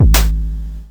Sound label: Electron Sidstation, Drum Machines